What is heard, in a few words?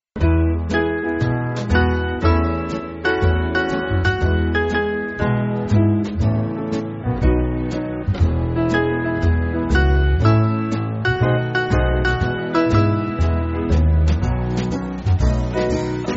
Band